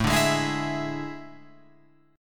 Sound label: A Major 7th Suspended 4th, Guitar, Open F; AM7sus4; strummed